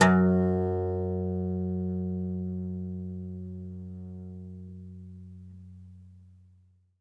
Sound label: OUD F#1, Plucked Strings; E-MU Producer Series Vol. 7 – Old World Instruments